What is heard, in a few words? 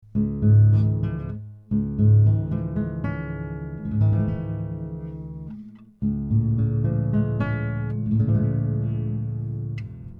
Nylonstring